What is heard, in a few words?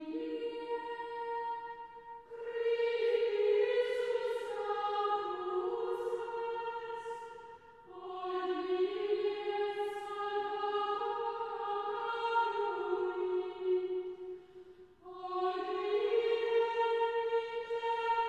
Chant Antiphon; Traditional; Chester Processional